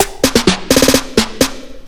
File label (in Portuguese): Caixas Prontas